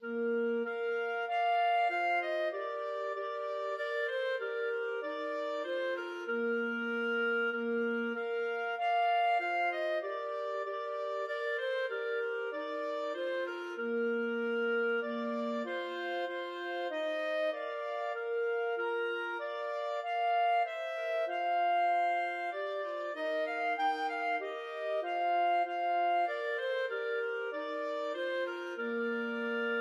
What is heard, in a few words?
Christmas Hymn clarinet trio